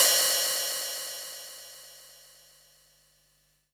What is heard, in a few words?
Hat Open, Drums, Roland MT32